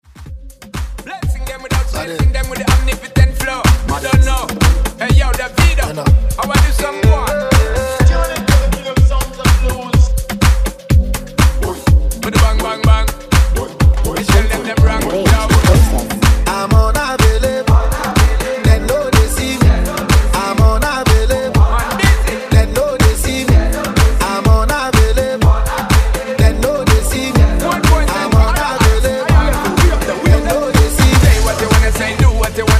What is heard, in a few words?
Dance Redrum